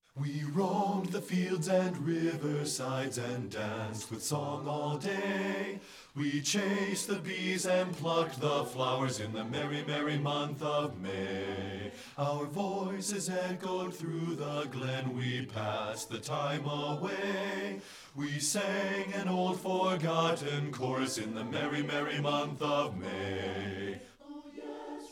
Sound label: BASS; satb